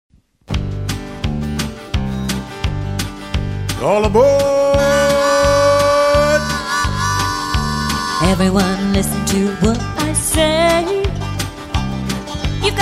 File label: phonics train song